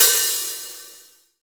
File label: long room reverb crash sound d sharp key, Royality free drum crash sound tuned to the D# note; drum sample